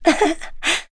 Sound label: Laugh; Laudia; kingsraid; voices heroes